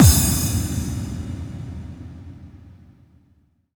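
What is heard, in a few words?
bass drum FX, dance drums; Best Service Dance Mega Drums; BD-FX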